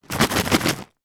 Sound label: skittles sweets shake in packet